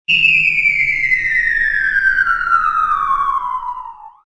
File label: Bomb Drop; SFX; Dino Love Simulation